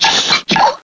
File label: tyre; bifftyre